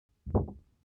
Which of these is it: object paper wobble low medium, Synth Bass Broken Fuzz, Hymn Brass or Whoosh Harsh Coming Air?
object paper wobble low medium